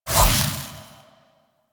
Switch Fire; Warframe; Wisp

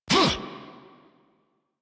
Melee Attack; New War; Erra

